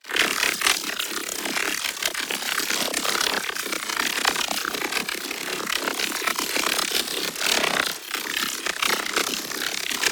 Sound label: ABILITY CHARGE Granular Protection Shield; boom library; Matter